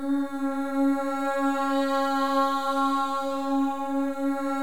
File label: MOVING V2, Symphony of Voices; Spectrasonics